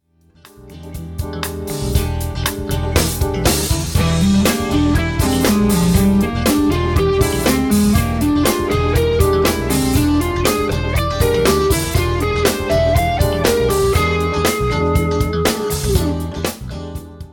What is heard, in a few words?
Fretboard Navigations, Guitar Techniques; Guitar Techniques 2019 Issue 297 July